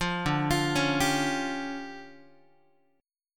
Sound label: D Minor Major 7th Double Flat 5th, D, Guitar; DmM7bb5; arpeggiated; JGuitar